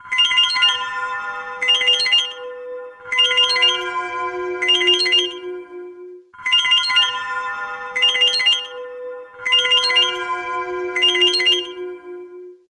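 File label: Samsung Galaxy A12, ringtone; Nacho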